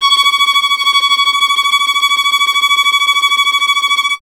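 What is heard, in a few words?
string violin tremolo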